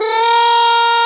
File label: BRS TRUMPETS, Roland LA